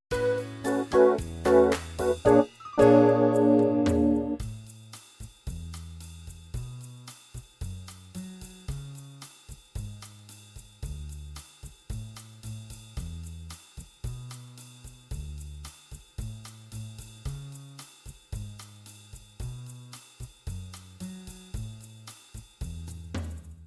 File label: improv in a